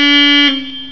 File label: honk